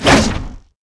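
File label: Attack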